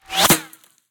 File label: landing gun whiz; smite; Ares